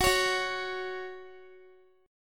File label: Gb 5th, Guitar; strummed; 4E,4A,5D,5G,5C,6F